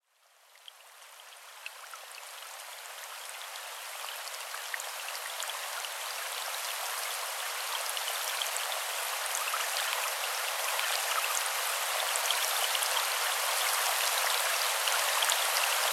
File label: gentle rain; sound oasis